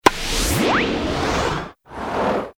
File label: bounce